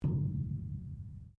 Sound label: METAL IMPACT ECHOE; Metal Sound Effects; Metal (Sound Effects:Materials)